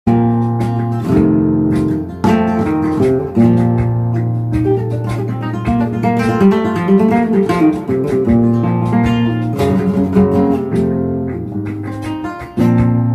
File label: alegria falseta guitar